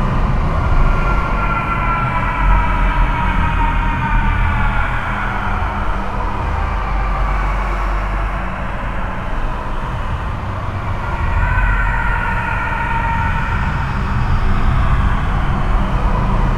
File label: scream loop, ambience; Quake 4 Alpha; id software